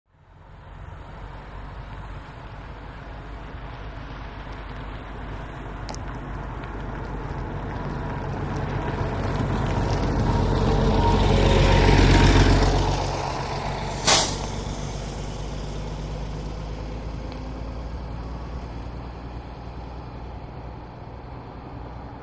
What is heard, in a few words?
Bus By Coach Drive Bye